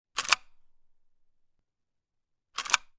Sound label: gun nova; still north media